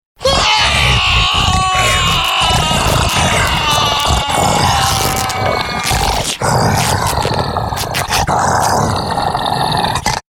Monster Attack, Man screams as savage monster attacks with sound of tearing clothes.; Monsters; Horror